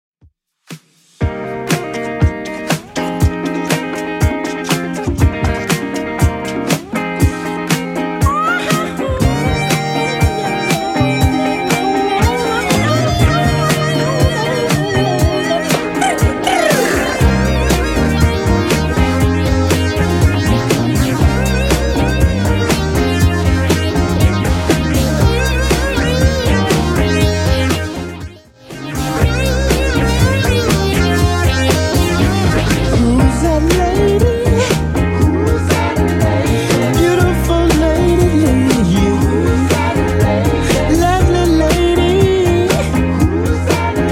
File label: 70s Redrum 120 bpm